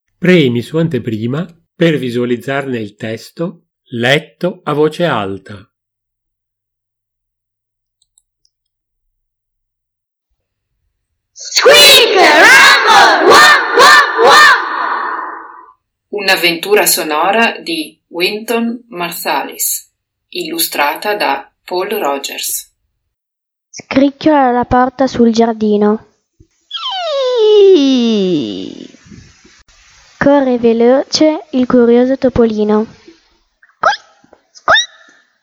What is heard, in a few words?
squeak rumble womp; UNAVVENTURA SONORA